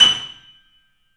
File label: The Piano; InVision Interactive - The Piano